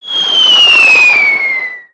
Spider Boss Fire Rain Whistle; creatures mechanical

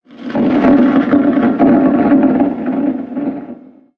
sky rumble Short

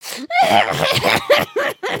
cry

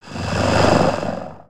Idle Breath, creatures organic

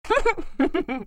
Giggle

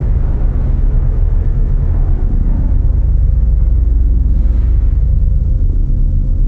Engine Corner, ambience spaceship

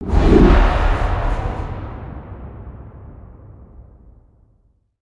Wind Leap, ambience spaceship; Starbase

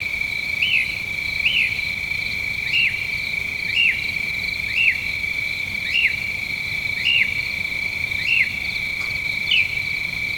night bird loop; nimbus station

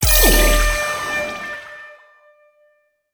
Celebrate; foley

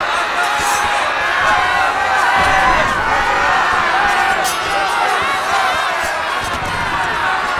pirate fight ambience

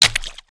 paint splats; build mode

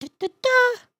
hat dance, emotes

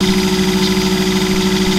Fireworks Machine; Concert

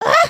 soccer

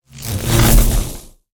Spinjitsu Lightning One shot

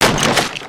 Crates n Boxes, destructibles

